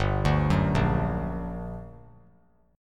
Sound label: G Minor Major 7th G, 3C, 3F, 3A, 3D; arpeggiated; 7,9,9,8